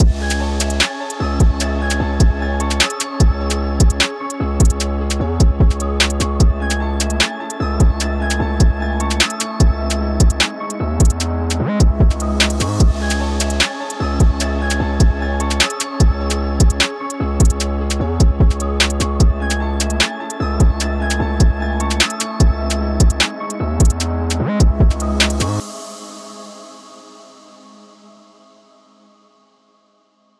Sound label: 150 BPM, Db